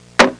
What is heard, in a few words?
a drawer; Curio Strip